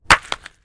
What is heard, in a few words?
ice